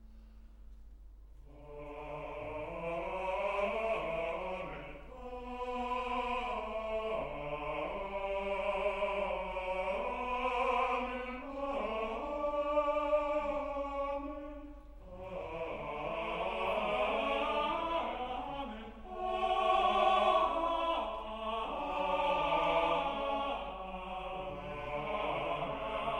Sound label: amen, chorus